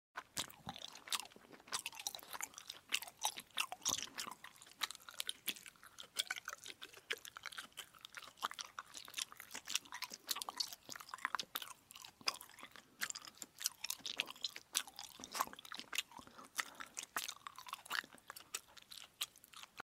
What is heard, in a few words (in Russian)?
jevatelnaya rezinka